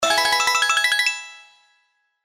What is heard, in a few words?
Win Bonus; slots; Oriental Ink